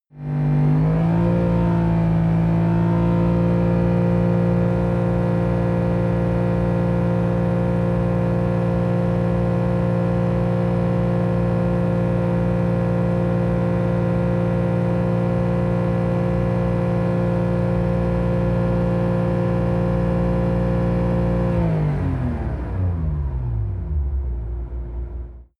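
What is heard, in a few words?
sports car interior engine drive constant fast; verkehr-transport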